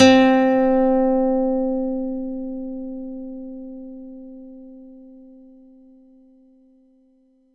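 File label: Roland JV-2080 Nylon Guitar C3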